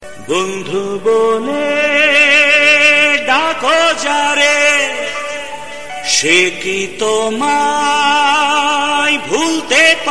Bengali Song Ringtone

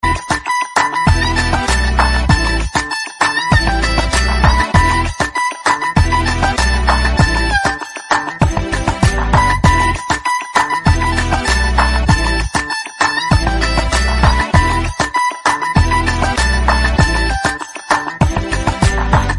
Telugu Theme Ringtone; Ibomma

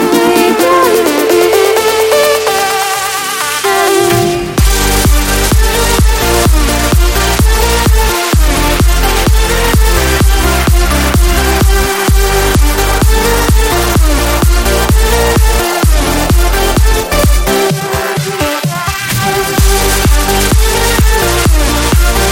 New Mobile Ringtone

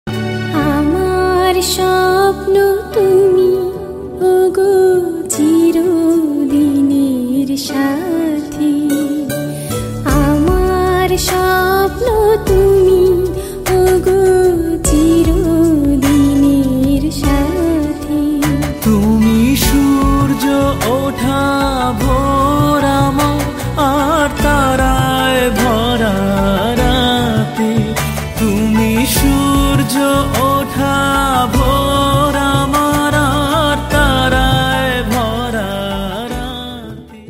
Bengali Romantic Ringtone

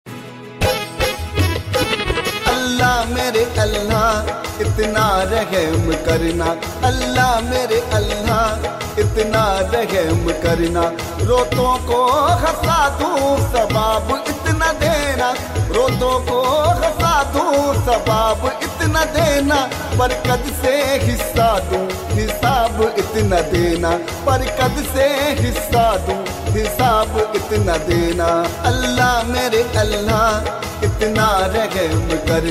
Islamic Ringtone

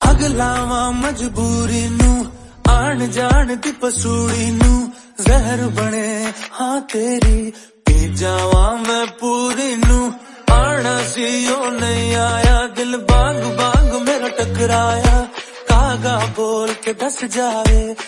Punjabi Song Ringtone

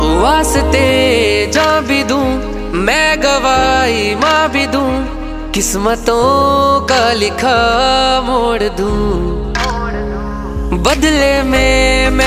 Ringtone Male Version

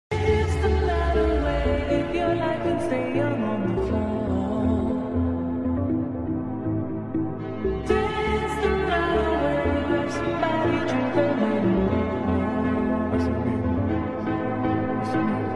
English Ringtone

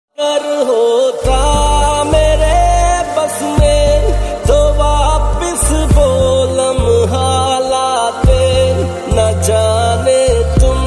Sad Song Ringtone

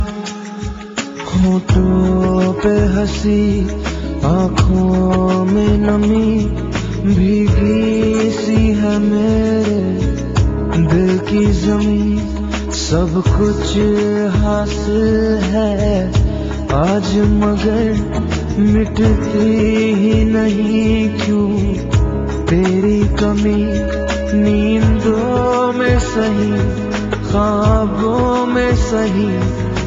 Most Sad Hindi Song Ringtone; ringtones

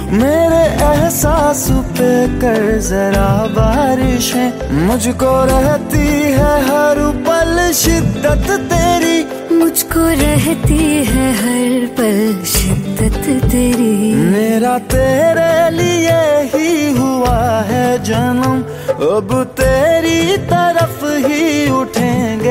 Hindi Love Song

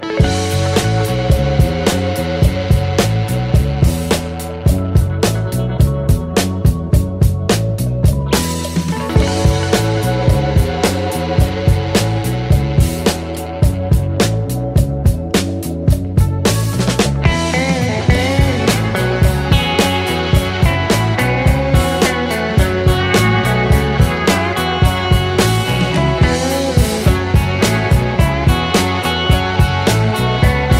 Samsung Ringtone

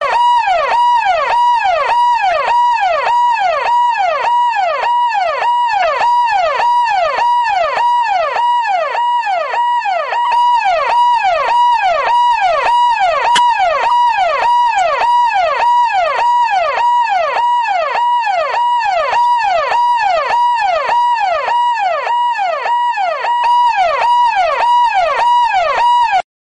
Delhi Police Siren Ringtone; ringtones

